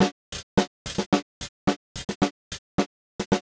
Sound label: Snare Gate HPF